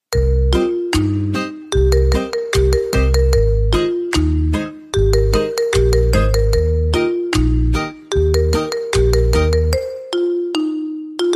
Marimba Ringtone

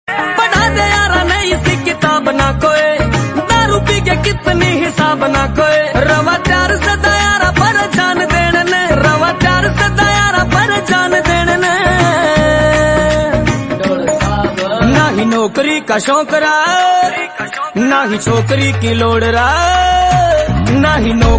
Punjabi Song Ringtone

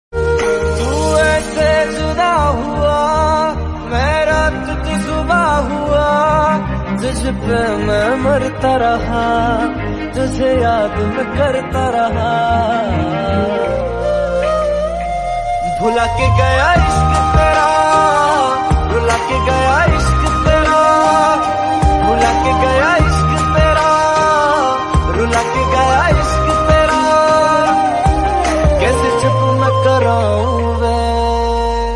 Sad Hindi Song Ringtone

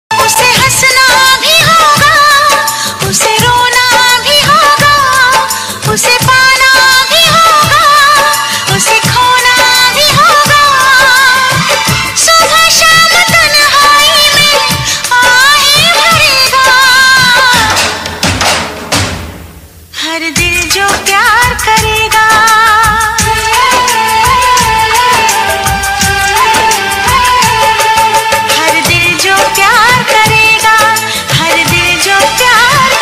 Female Song Ringtone

